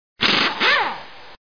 air impact wrench

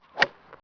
golf club hitting

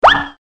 Banana Peel Slip Zip

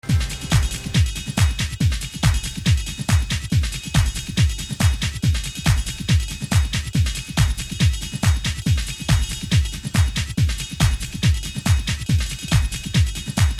Christmas Techno We Wish You A Merry Xmas

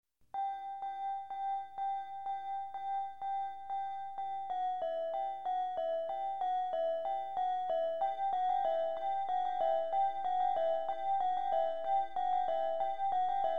Carol of the Bells techno mix

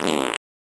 Another Fart

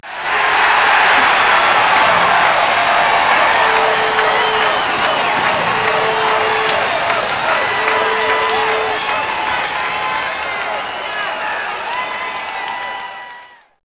Cheers

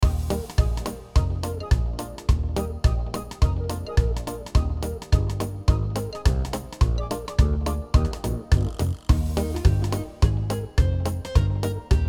Background Audio Loop

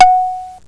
gong

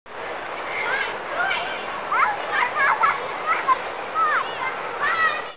playground ambient

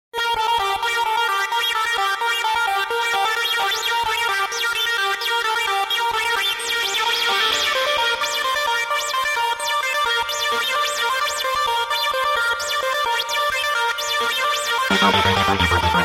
Techno Carol of the Bells

